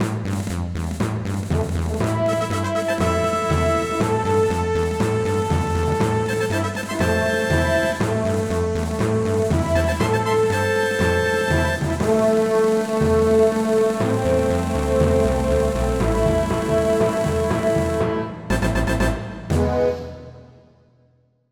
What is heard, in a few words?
End of Level (Fanfare), midi; Wolfenstein 3D Reworked MIDI Soundtrack 2019